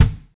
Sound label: clear kick sound g sharp key, kick drum single shot tuned to the G# note